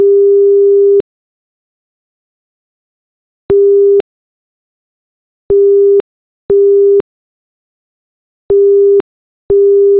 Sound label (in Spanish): hazme un sonido de curios; questioning